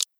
doc stage

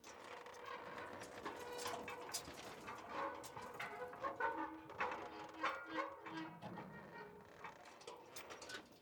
Windmill; The Town

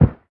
Carpet

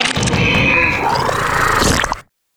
Leviathan Climbin

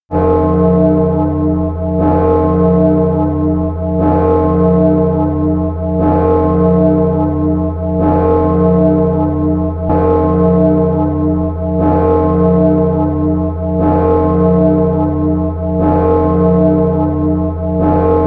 Bell Clock; Clock Tower 20th Anniversary Sound Collection

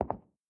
carpet footstep; adams venture origins

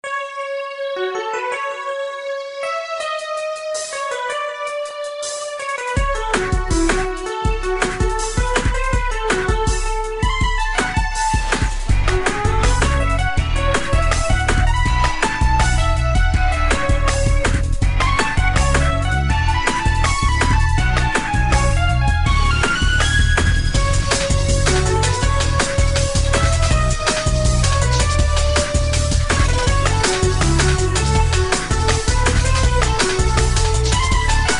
Drum and Bass; Mega Man Anniversary Collection; special remix tracks; Mega Man's Drum and Bass; Capcom

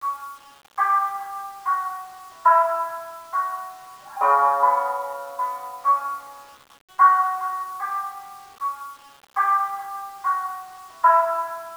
bgm shamisen; yakuza ryu ga gotoku kiwami 2 pc gamerip

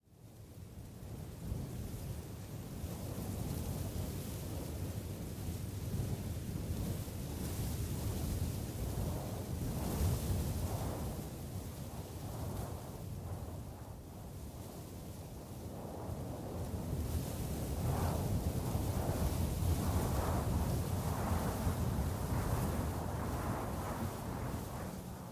Rustling Brush; junk jack mobile

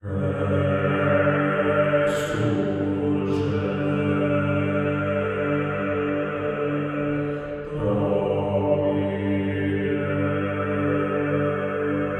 Choir

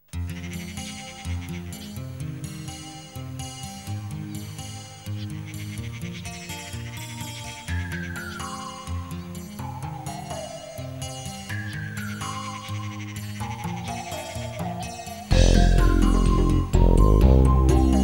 Rain Forest; Fire and Ice; amiga cd32

